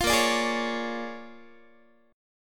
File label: A sharp 7th Sharp 9th A sharp down Guitar; A#7#9; strummed